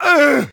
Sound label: hurt; CosmicRage; combat; creatures